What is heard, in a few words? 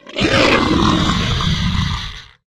mflesh death; STALKER; customnpcs